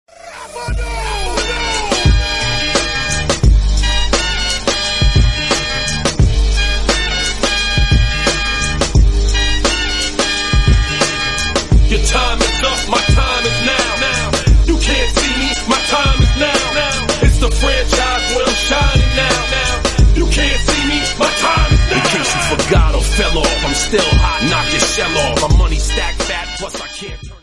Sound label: entry music and phone ringtone; wwe